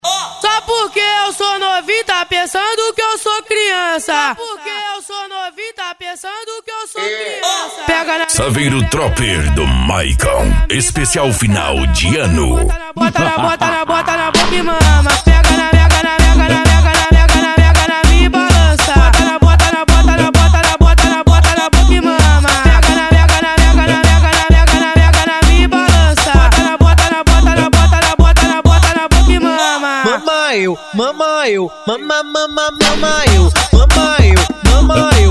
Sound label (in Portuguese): funk agressivo funk